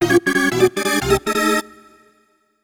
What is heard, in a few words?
(Jingle) Hurry Up; Dr. Mario Online Rx